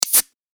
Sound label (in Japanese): 缶ジュース を 開ける